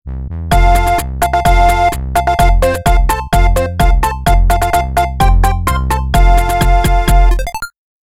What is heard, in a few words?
scramble intro jingle